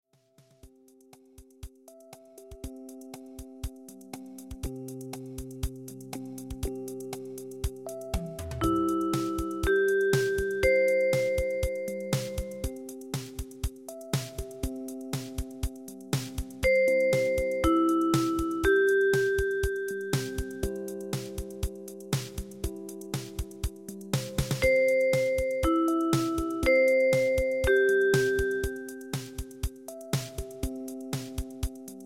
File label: Major Vibes